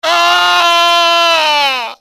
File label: death scream; Soldier Of Fortune II Double Helix; XBOX; sanchez